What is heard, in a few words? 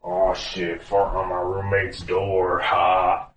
fart on my roommates door